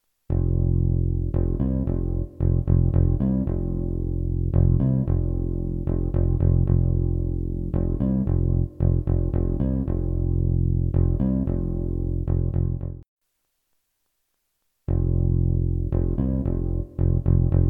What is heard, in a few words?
World Original Bass Part